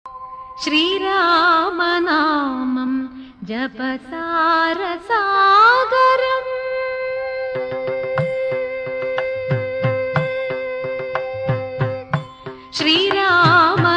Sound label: malayalam evergreen songs; urvashi